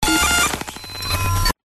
Electro Magnetic; PMSFX

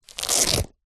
foley sneaker childs velcro pull; zapsplat

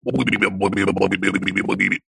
cartoon voice grumpy head shake or lip wobble

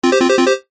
multimedia game retro musical warning

